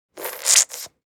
Hair gel bottle squeeze

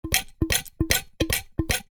Garden hose nozzle pressing; hand tool

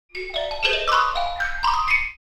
Old vintage cartoon dizzy xylophone

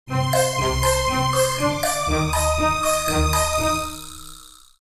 Cartoon funny transition comedy dramatic

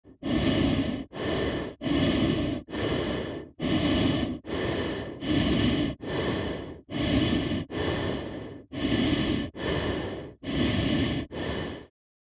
Gas mask anxious breathing; military equipment sound effects

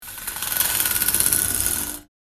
Metal venetian blind slat rattle roll up; gfxsounds

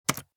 Laptop keyboard keystroke pressing enter key

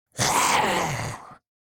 Demon beast imp monster sigh surprise

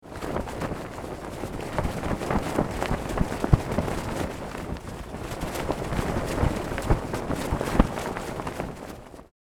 Ship flag flapping flag pole no wind

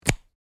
Electrical plug insert click plug in

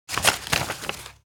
Folding paper short

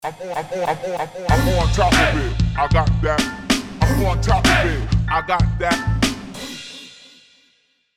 Aggressive thug life drop; social media

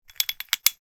Metallic clinking rattling short

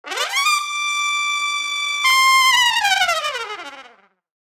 Cartoon suspense brass rising tension; cartoon ascend

